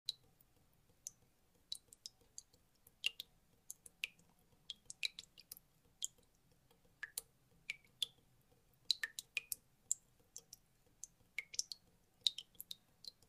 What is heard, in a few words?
Water Drips Kitchen